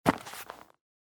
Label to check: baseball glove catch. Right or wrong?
Right